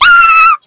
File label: scream of super scary monster